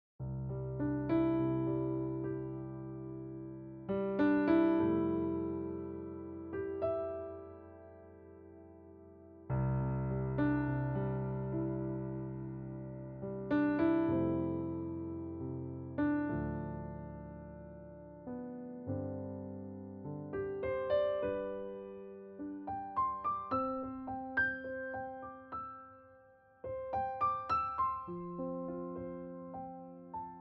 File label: Galaxy Vintage D; Amazona Demo